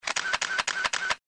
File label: camera burst; Z555i